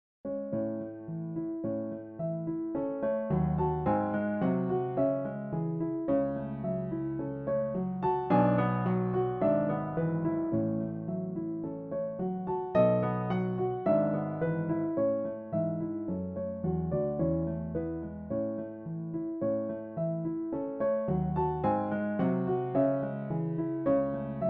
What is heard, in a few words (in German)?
Romanze; Schuhmann seconde, rapidement romance